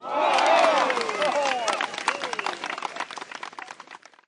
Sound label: Jingle Bonus Level You Win; rayman 2